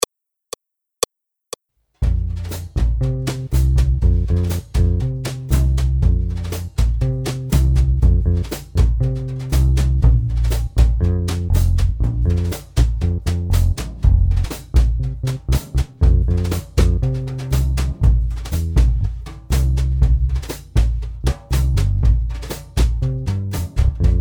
tango, Accompagnement seul

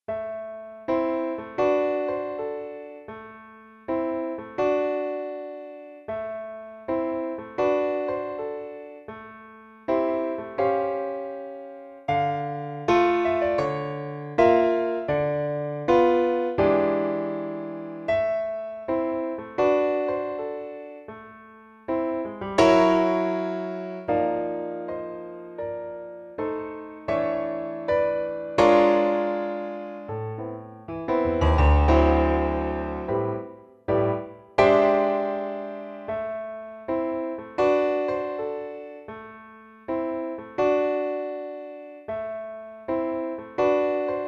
Blues Piano seul; Jazz

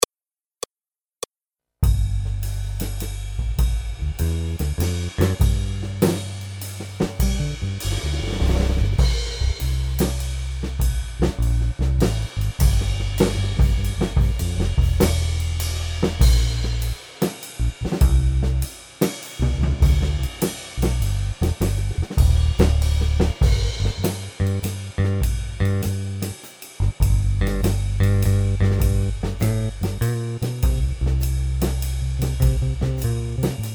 The Barber blues Accompagnement seul, Jazz, piano

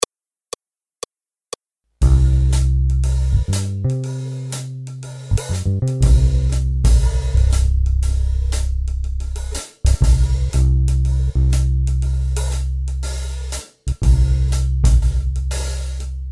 Midnight blues Accompagnement seul

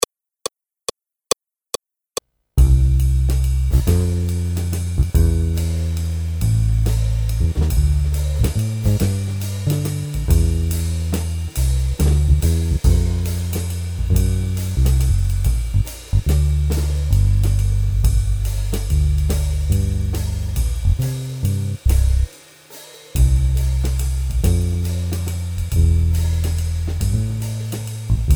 Waltz, Accompagnement seul; Jazz

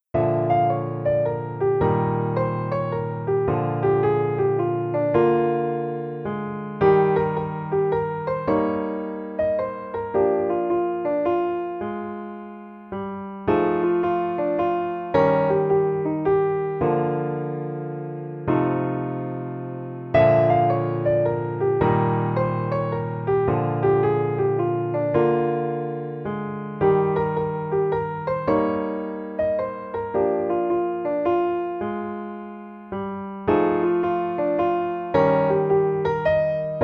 Waltz & blues, Piano seul

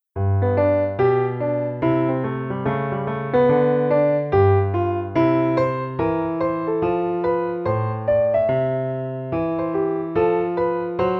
Piano seul

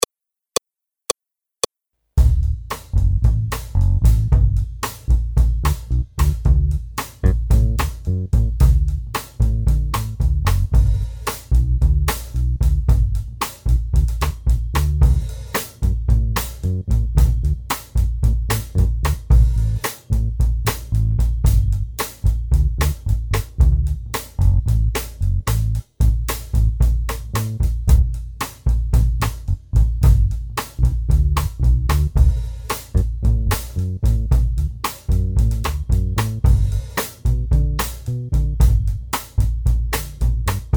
Boogie, Accompagnement seul

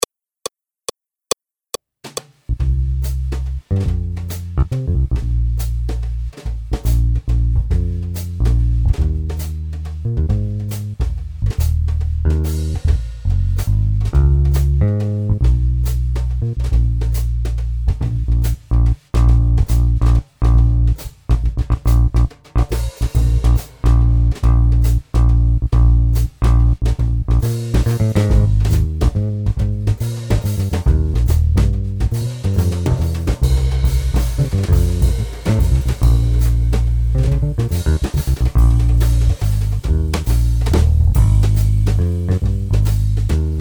Jazz Waltz, Accompagnement seul

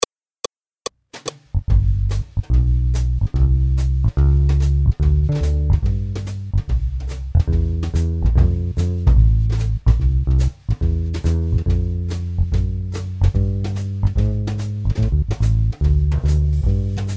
Happy morning Accompagnement seul

